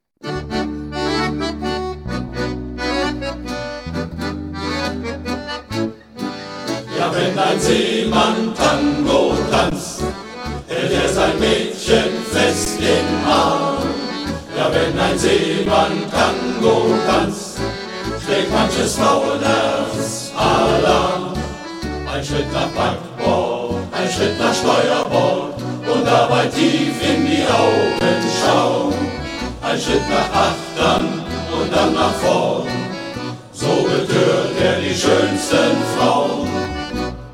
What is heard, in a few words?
Tango